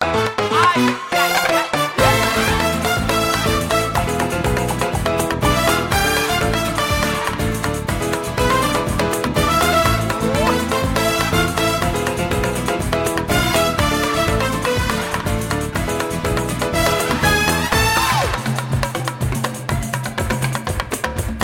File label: Rio Samba; happy